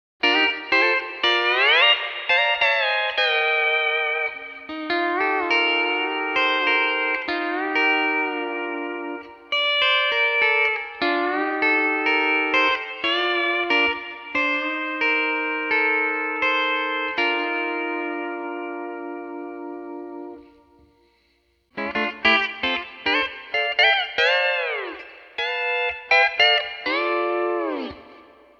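Reverb Changed To Plate 4.0 Seconds, D13 steel guitar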